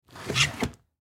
Cardboard Dresser, Cardboard Dresser Close Drawer Fast; Drawers; Household; Blastwave